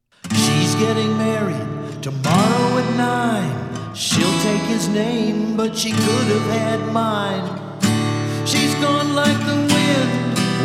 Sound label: reverb